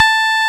Roxichord, E.Piano

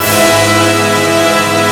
ORCH CHRD; Greytsounds VOL-1; SYNCLAV TRAX

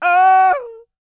SING, World Voices, CARIBBEAN; Spectrasonics Vocal Planet CD5

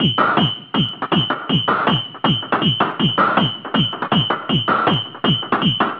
Drum Bass, Drumloops, 160bpm; Zero-G; Total Drum Bass

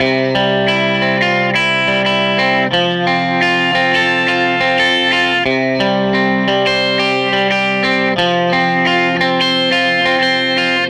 Tele Guitar Loop

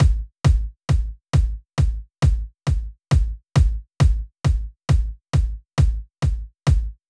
135 Kick; Zero-G Trance Inducer; 90 s Sample CDs

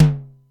SDS9 HT, Simmons SDS-9; SDS9 Factory A5

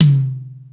Rock N Roll, E-MU Pro-Cussion

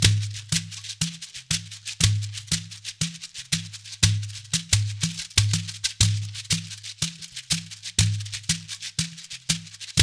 Shekere; Spectrasonics - Supreme Beats - World Dance

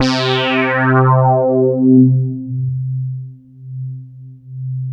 P800 FD C3, Korg POLY800; Back In Time Records; Korg Universe VOL-1

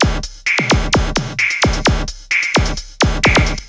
Beat (130BPM), Gated Reverb; musicradar

